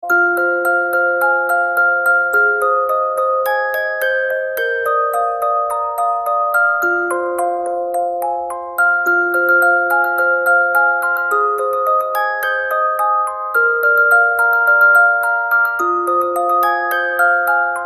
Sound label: telephone